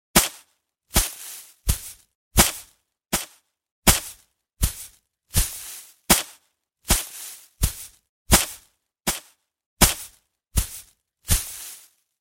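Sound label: bath broom in sauna sound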